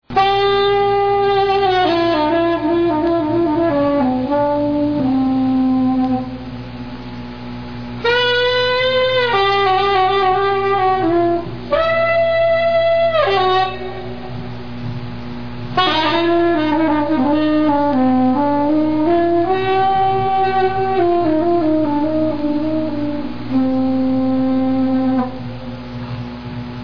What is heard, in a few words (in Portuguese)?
sopro